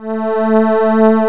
DIDI PAD, SYNTHESIZER; AKAI S6000 CD-ROM - Volume 1

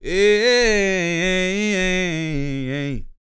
MOANIN, Vocal Planet Blues & Soul; ILIO; Vocal Planet VOL-2

